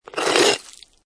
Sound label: Snow And Ice Ice Or Snow Scrape With Debris; Weather; Lynne Music